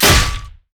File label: basicattack dragon; LoL; shyvana